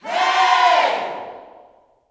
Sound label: "HEY", Gospel, YELL SHOUT; ILIO; Vocal Planet